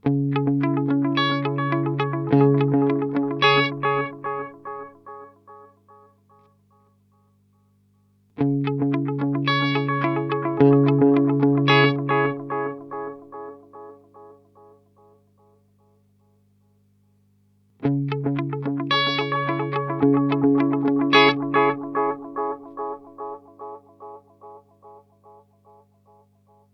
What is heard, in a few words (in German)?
Echosex 3 Tone max 12 Uhr min; Gurus